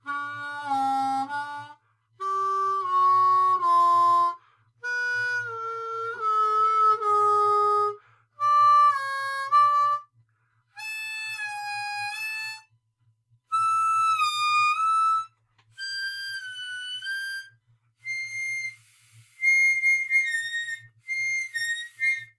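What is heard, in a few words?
Regular Bends, harmonica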